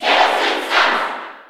Cheer JP; Zero Suit Samus; SSB4

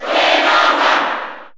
Cheer Japanese; Wii Fit Trainer; SSBU

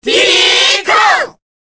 Cheer Korean; Diddy Kong; SSBB

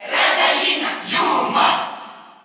Cheer Russian; Rosalina & Luma; SSBU

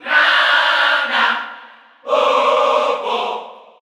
Cheer Spanish; Ice Climbers; SSBU

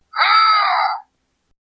Wiimote Sound; Lucario

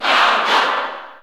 Cheer English; SSBU

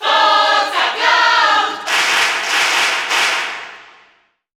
Cheer Italian; Cloud; SSBU

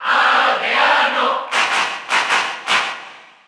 Male Cheer Spanish; Villager; SSBU

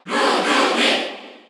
Cheer Korean; SSBU

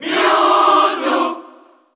Cheer Spanish; Mewtwo; SSB4; SSBU

